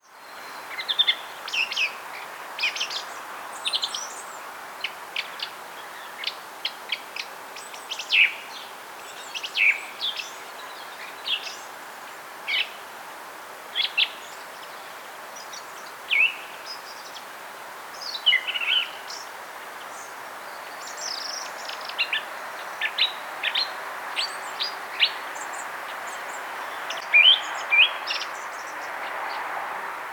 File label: thrush